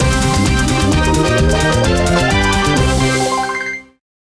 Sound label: free spin init; Amatic; All Ways Fruits